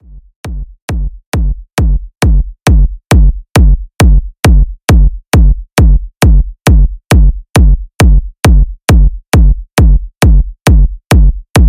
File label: signature kicks; zumstein